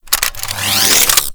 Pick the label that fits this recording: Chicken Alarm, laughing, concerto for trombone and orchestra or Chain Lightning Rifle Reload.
Chain Lightning Rifle Reload